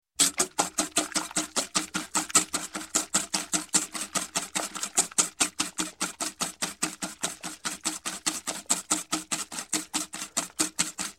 Mixing Beating Eggs With Whisk; Sound Ideas 2000 Series

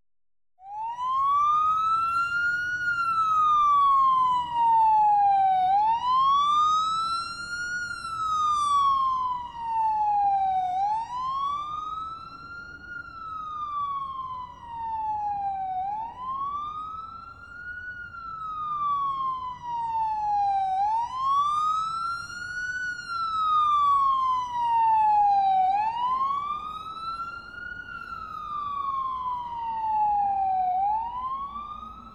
Police sirens (American); Skylines & City Sounds

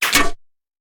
Bullet Impact; Sound Ideas; Guns

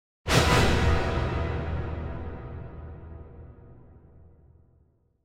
Symphonic Hit Battle Finale - Double; Hollywood Edge; sound designer's toolkit v2

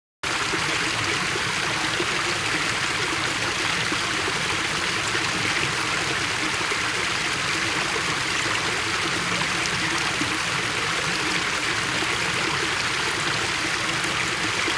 water stream large

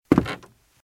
Footsteps - Wood - Creaky - Walk - Left

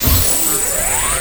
Scifi Power Up; Energy & Forcefields

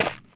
dirt; firefight

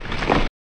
Dead Tree

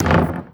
Street Ball Glass Back

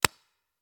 Paintball Gun; Sound Ideas; Guns

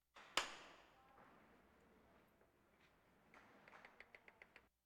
Animal effects snorts grunts farm ambience

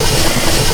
slide Noise; blindside

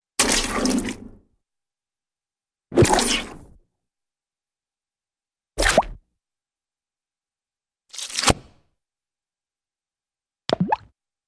Wet Reverberant Squishy Impact - Pop Impact Plop; Cartoon; Hollywood Edge; Cartoon Trax

